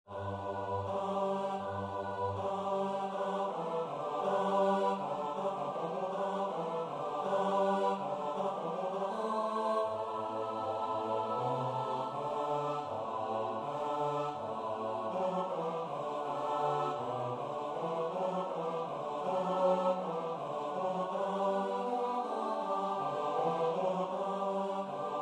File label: bas; korsats